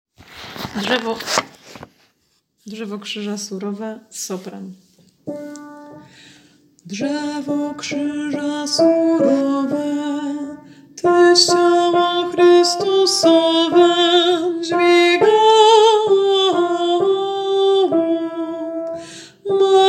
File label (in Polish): sopran; Drzewo krzyza; Wielki Post